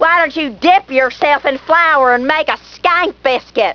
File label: skank